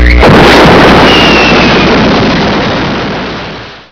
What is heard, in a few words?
boom; Fleming scoreboard